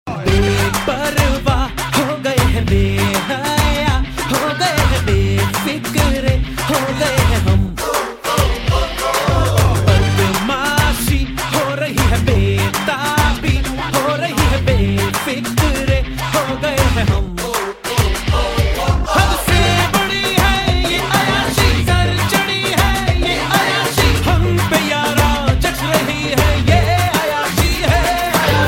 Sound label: Loud Tone